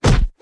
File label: hands hit wall; czero